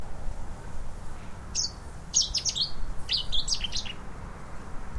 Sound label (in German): seidensaenger